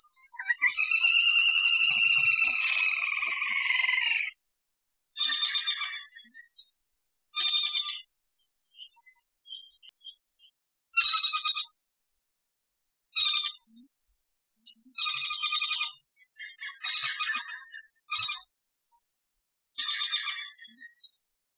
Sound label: Woodpecker, bird call